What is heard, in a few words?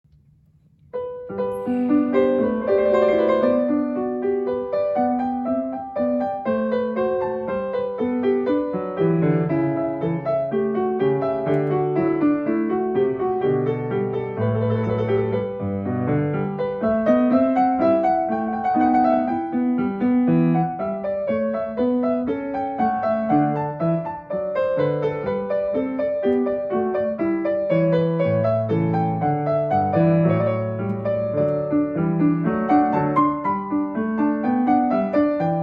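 French Suites Allemande Reverb Medium Hall; French Suites No5 Allemande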